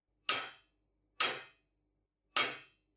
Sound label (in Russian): zvuk udara po metallu